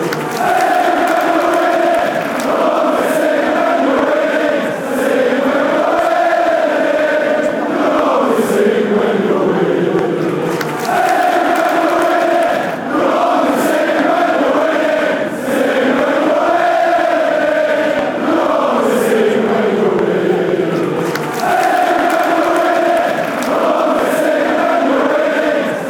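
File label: you only sing when youre winning, football chant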